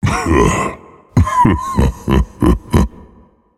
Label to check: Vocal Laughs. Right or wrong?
Right